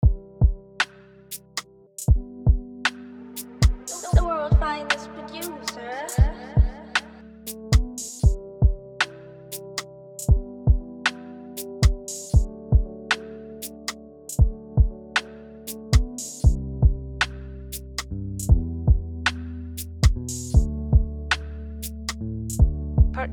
117 BPM, Afrobeat Instrumental

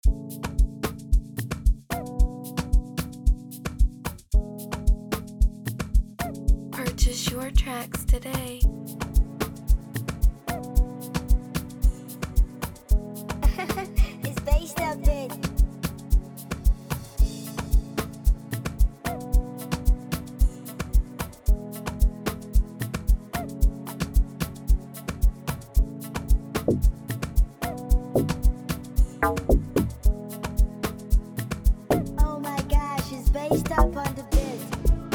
112bpm A major, afrobeat instrumental

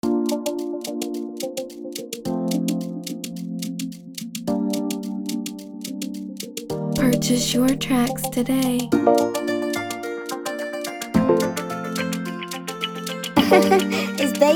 Instrumental

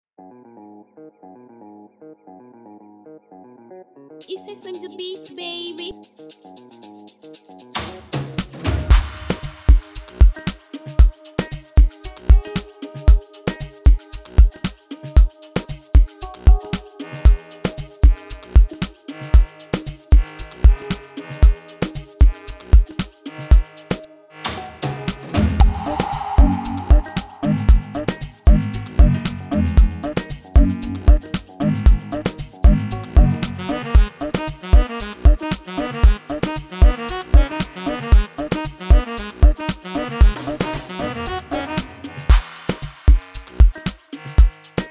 Without Log drum Bass

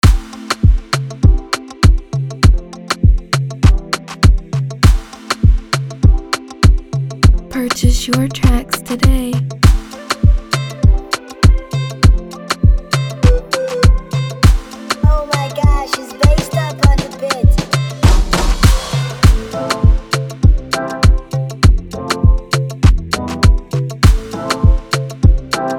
Instrumental, Afrobeat Instrumental

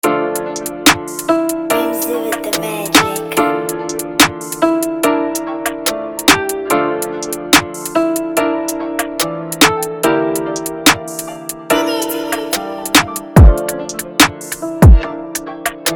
AfroDrill Type Beat

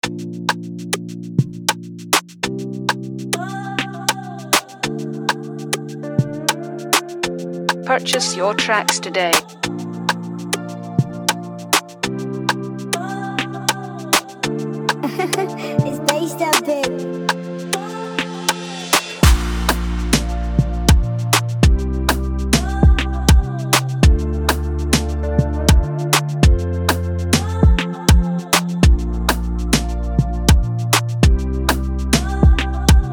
100 bpm, Afrobeat Instrumental

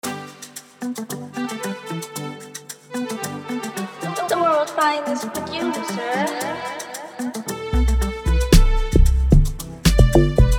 113 BPM, Afrobeat Instrumental

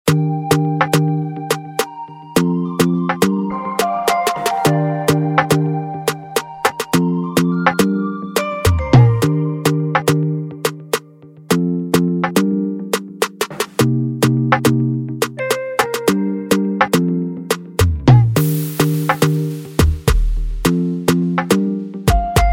BEAT, afrobeat

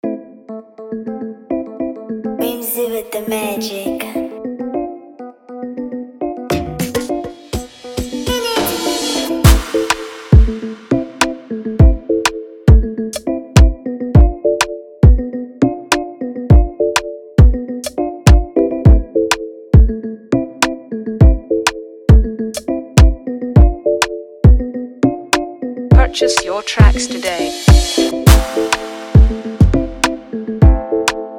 Afrobeat Instrumental

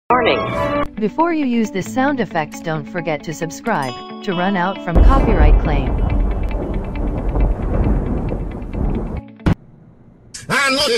COMEDY SOUND EFFECT; NIGERIA

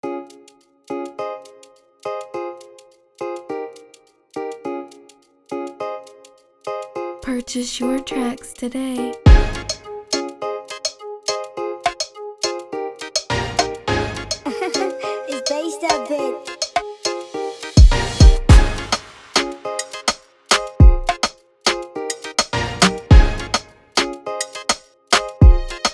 Instrumental, afrobeat instrumental

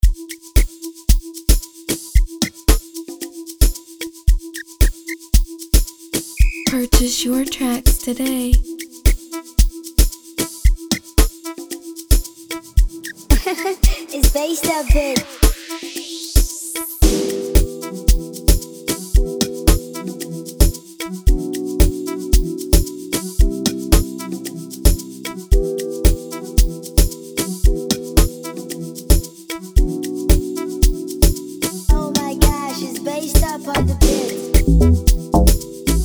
Amapiano 113bpm G major

